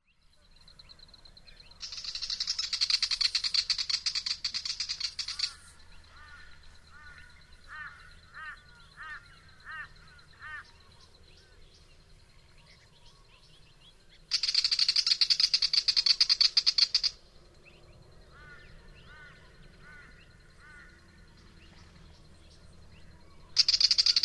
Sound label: Willie wagtail, پرنده دم چتری ویلی